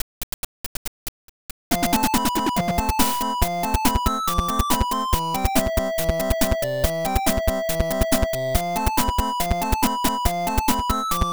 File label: rock 8-bit